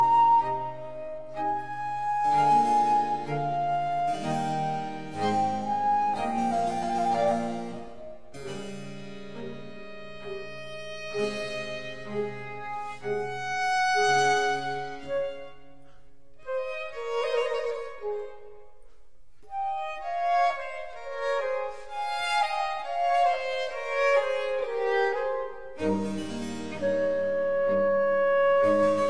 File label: Quartet No 3 in A major, Adagio; Quatrieme livre de 6 quatuors; TWV 43A4